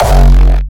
bassdrum bedroom, Kicks; Fleshpean

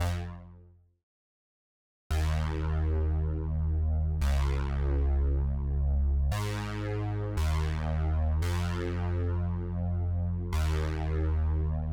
114 BPM F Min Bass; Melody Loops; Bayside; Retro Collection; Cymatics; ANALOG EVOLUTION Beta

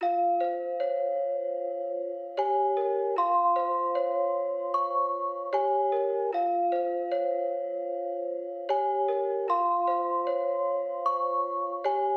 152 BPM E Min Bells; Trap; Melody Loops; Loop Stems; Cymatics; Nightmares Trap Melody Loops; Firing Squad

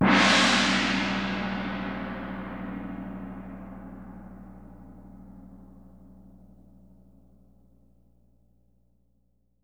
Gong - C; percussion; Cymatics - Infinity Beta Pack 2.0; Zeus Percussion Collection

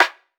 Rimshot Snare; Drum One Shots; Snares; Cymatics; Cobra Hip Hop Sample Pack

